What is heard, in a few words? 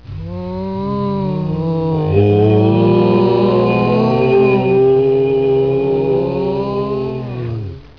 Ghost Message Tone